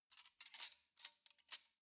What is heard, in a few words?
cutlery knives handle soft